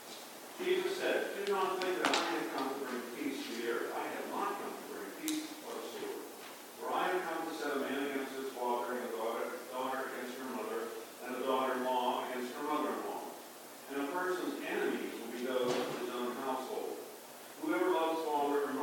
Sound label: Gospel